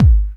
BD, Dance Mega Drums, HIP; 90 s Sample CDs; Best Service

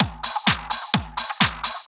Clubhouse Loop 128 BPM

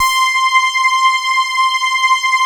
OB LOW P

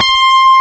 C5, GUITAR; AKAI S-Series CD-ROM Sound Library VOL-8

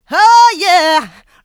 Vocals, Adlibs; Houseworx

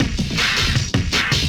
LOOP, 160 BPM, Drum & Bass

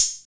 HH HH, Dance

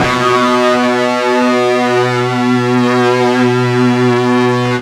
FUZZ B 1, ULTRA FUZZ, Guitar; East West; Steve Stevens Guitar Samples Collection

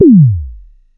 TOM KIT C4, TOM SYNTH; USB Soundscan vol.20 - Fresh Disco House I

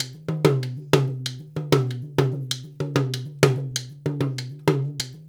loop, percussion

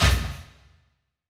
BD; Best Service; Dance Mega Drums

